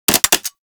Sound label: Vomit Gun Mech; Warframe; Weapons; Infested